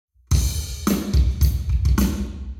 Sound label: Hall Drums 0deg; eMagLS